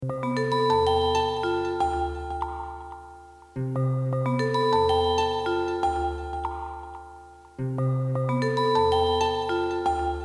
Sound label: tone